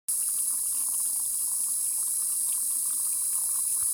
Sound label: noise